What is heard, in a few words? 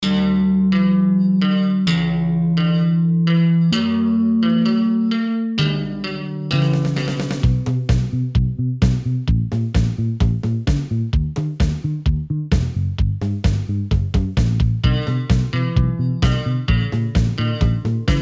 spaceship parade